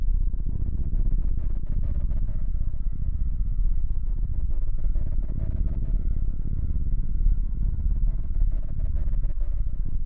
Ambient Attack; MiSide

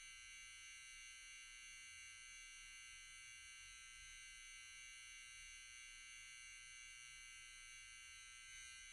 Lamp Noise; MiSide

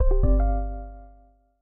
Win; MiSide

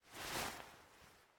Stand Up; Game rip; MiSide

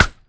Bullet Cut; MiSide

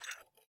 Cup Take; Game rip; MiSide

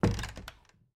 Scarf Open Check Close; Game rip; MiSide

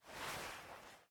Move Bed Stay; MiSide

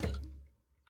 Glass Drop; Game rip; MiSide

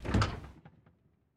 Fridge Open; Game rip; MiSide

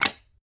Tile Use; Game rip; MiSide

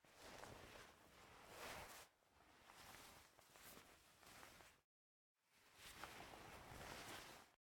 Ladder Up; Game rip; MiSide

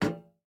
Step Iron; MiSide

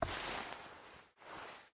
Player Jump Iron; Game rip; MiSide

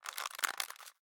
Ramen Open; Game rip; MiSide

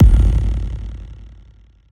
Gun Shot; MiSide

